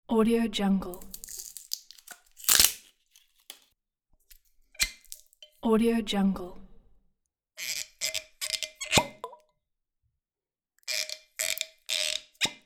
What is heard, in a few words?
opening a bottle of whiskey